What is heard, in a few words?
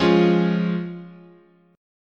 F Minor Sharp 5th, chord